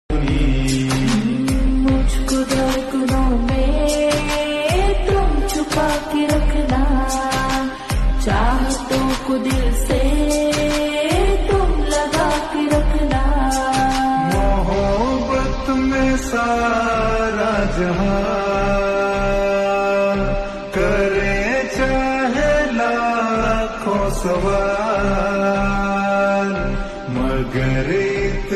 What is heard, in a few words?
Female Ringtone